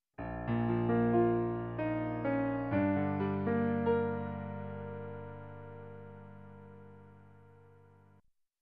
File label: Dramatic Piano Transition